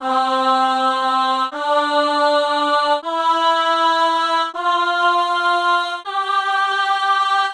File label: keys sample